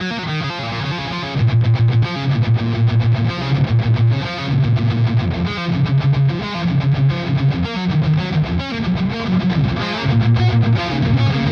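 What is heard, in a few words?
Schecter Omen Elite 7 Bridge Gain, guitar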